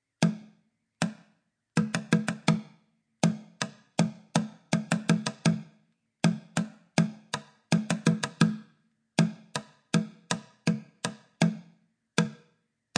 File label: drumming; level 4 green belt